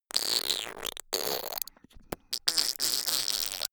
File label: fake fart